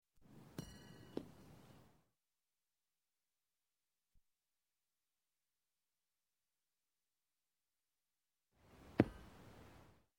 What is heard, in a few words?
Horseshoe Pit; Sound Ideas 2000 Series